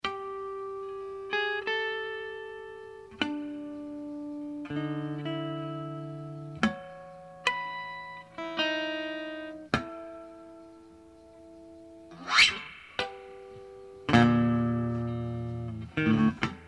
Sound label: sound Forest